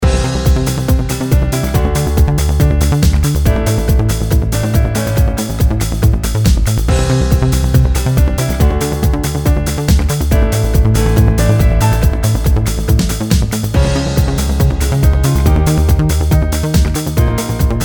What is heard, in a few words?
bgm